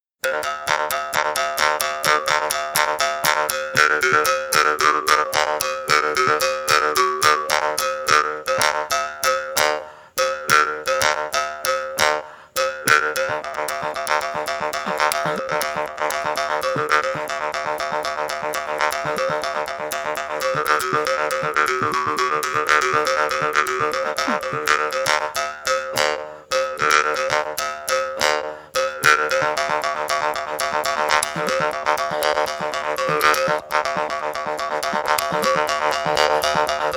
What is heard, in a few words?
Genggong Solo, gamelan